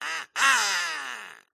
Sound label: duck call vocalization ahahh with gap mid triumphant; skyclad sound